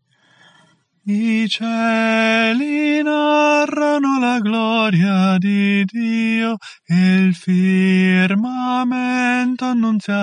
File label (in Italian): ritornello soprani, coro